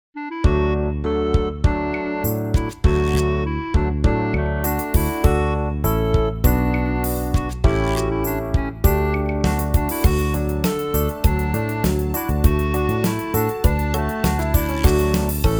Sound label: RPG village; City